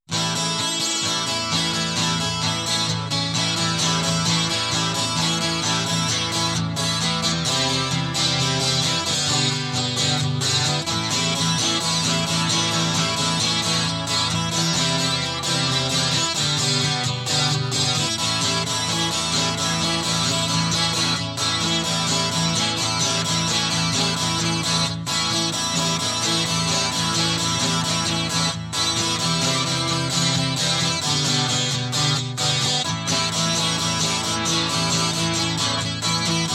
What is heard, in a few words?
Guitar Loop